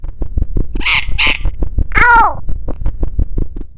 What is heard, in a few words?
Eat; talking tom cat android ios mobile gamerip 2010